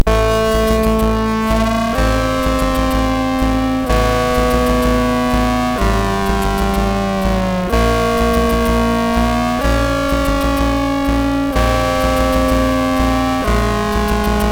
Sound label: Game Over, MOS6581R3; hvsc